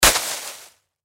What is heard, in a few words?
Impact Leaves Object Drop On Foliage; Rustling; Blastwave